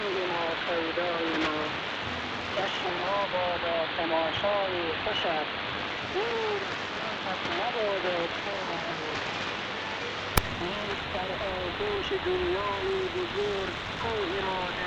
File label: Radio Free Afghanistan 15090khz AM